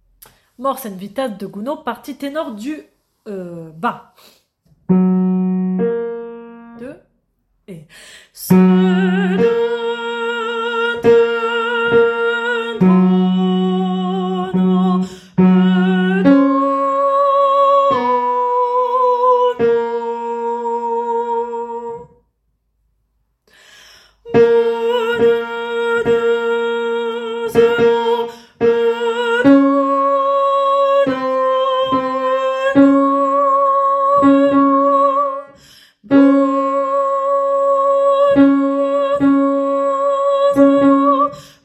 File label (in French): partie tenor du bas; Mors et vita